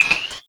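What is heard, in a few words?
noise; Sonic Foundry (Sony Creative Software); Esoterik Beatz; Saver FX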